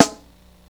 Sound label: smooth bottom end acoustic snare sample c sharp key